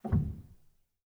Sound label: rel, Grand Piano; bitKlavier Grand; Piano Bar